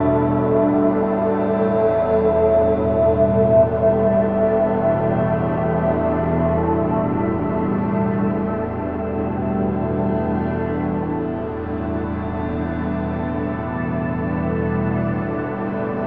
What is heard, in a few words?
modular build music loop